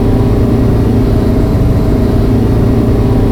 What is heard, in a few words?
third level, car; vehicles; garrysmod; sincar